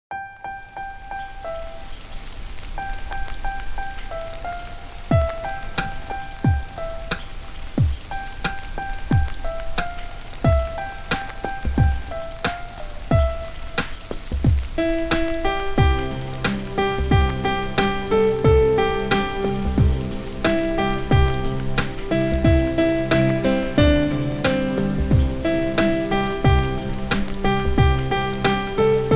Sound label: Backing track